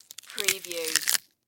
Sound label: Carcass Flesh Rip